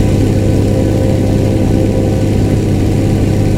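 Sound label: stuka cockpit left, engines; War Thunder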